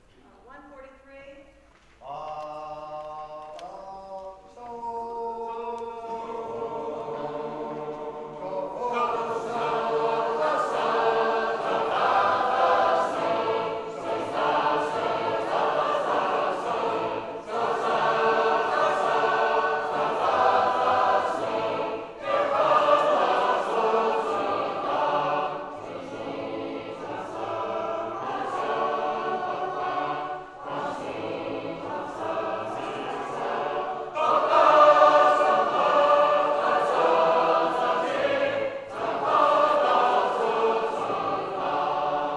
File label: Pleyel's Hymn (First)